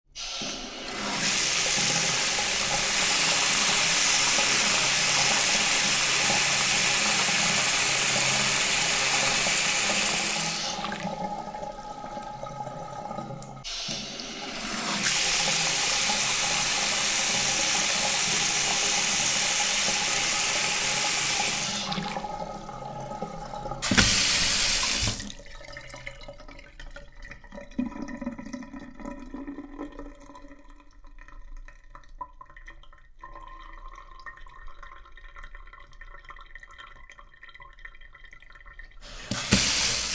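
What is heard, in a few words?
Taps Turned On and Off - Powerful water from taps; water runs down plug hole and away; Miscellaneous Household; Tom Hutchings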